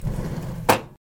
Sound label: screen door